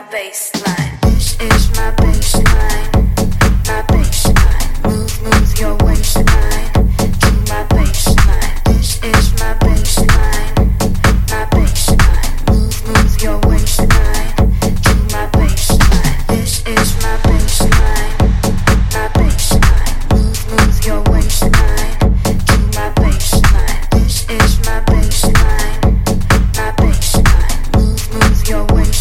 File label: Bassline; Basstype